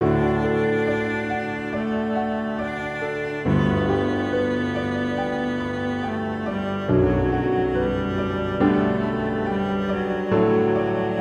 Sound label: little song without words for cello piano; set in major; schmidt julius